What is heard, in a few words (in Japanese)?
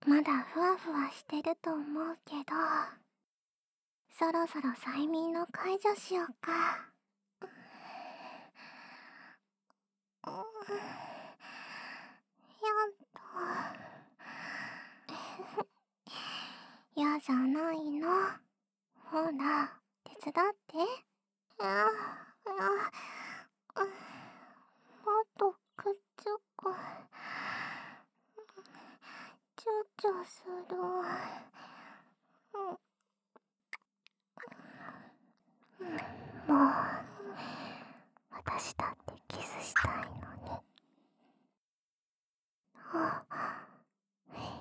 いっぱいKISS; ふたりがけ催眠ドライイキサポート編